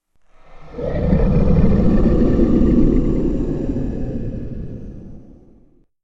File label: monster